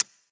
one chip; blackjack3

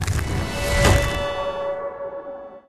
fire Wild Animation; elements

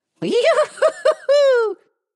bigwin yohoo; goldentavern

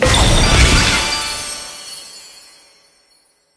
bonus Multiplier Level; fairyred

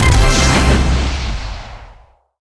respin wild land; joker pro

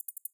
count Up Loop; hotline2

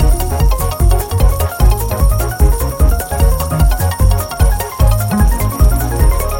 music big win; reef raider

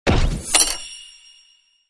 chest free spin landed; lost relics

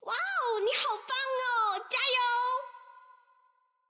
very happy; Princess; koi princess

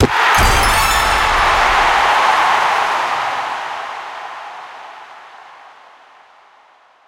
bonus Game Save; football

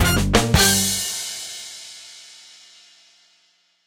Win; scruffy duck

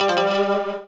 scatter Hit; reel steal

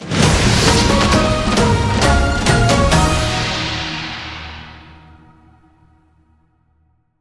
large Win; koi princess

